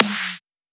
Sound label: Dubstep Snare